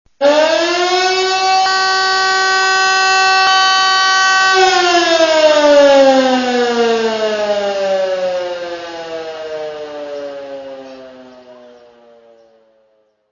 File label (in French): sonnerie alarme